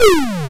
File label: YM Zoom; SFX; CHIPSHOP DELUXE; CHIPSHOP HITS